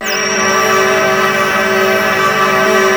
A4 VOX; Northstar - The Wizard VOL-1; VOXTAGON

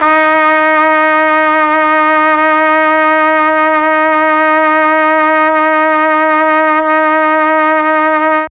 D#2 TRUMPET, Mellotron; CHMB TRUMPET; Mike Pinder - Mellotron Archive CD

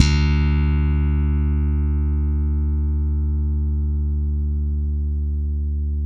SLAP D 3, bass; Spectrasonics - Bass Legends CD 1; ALL THUMBS

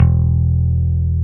Basses, Roland

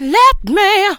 LET ME VOX, Funky Vocals, SHOUTS; USB Soundscan vol.18

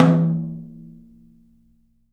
TOM; 3D Sound; 3D Drums

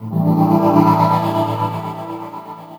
CHORD, Drum & Bass, 145 BPM; Ueberschall; Drum & Bass Flash Trackz

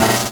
Perc Hit, drums; Drums & Drones

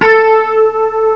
guitar hi; GUITARS; M8; CMI Factory

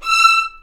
E6, VLNS ARCO; East West; The Ultimate String Collection

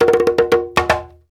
BRKDR, Reggae

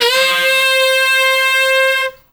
SAX, W. AFR.; Spectrasonics; Heart of Africa

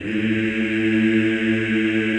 CHO A1, Eeh; Eeh + Ehh

